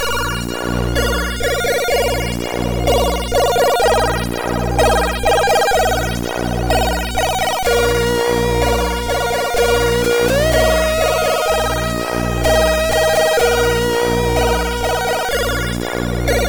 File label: Sample, MOS6581R3